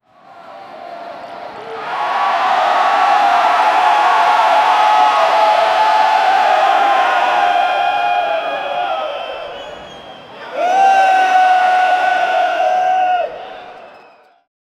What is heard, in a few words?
paparazzi and fans are ye, celebrity